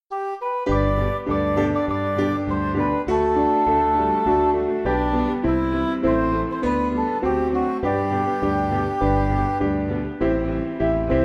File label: Music Backing Files for Churches